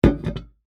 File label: Pot Set Down, Household, On, Wood, Full, Small, Metal, Antique; Set Down Sound Effects; BlastWave